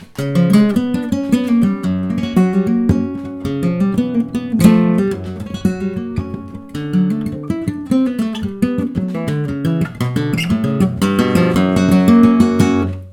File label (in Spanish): falseta